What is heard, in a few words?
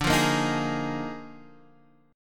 D sharp Major 7th Suspended 2nd Suspended 4th D, chord